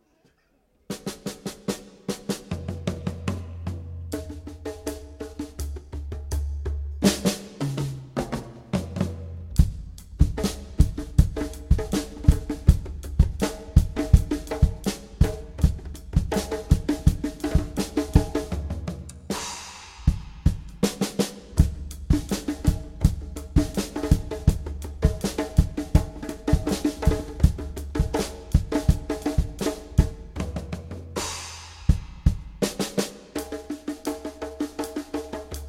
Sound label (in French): Percu folies